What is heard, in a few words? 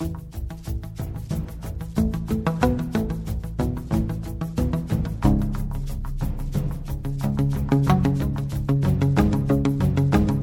keys, jazz loops